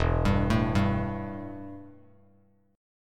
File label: E Minor Double Flat 5th E, Bass Standard; arpeggiated; JGuitar